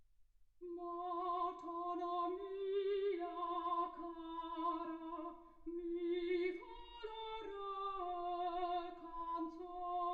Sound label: soprano